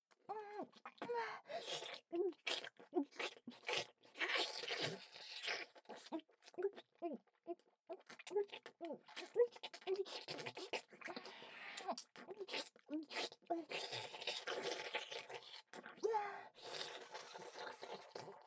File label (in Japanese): シックスナインで無理やりピストンフェラ; フェラチオ切り抜き集; ユイ